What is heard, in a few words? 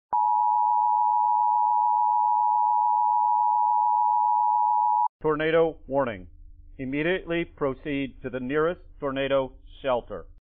Tornado Tone Warning